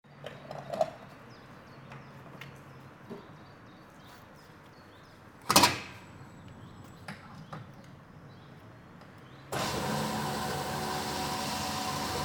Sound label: tank petrol